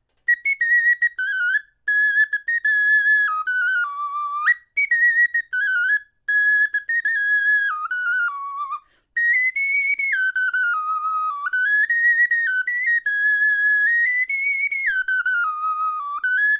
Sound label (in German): tonfloete melodie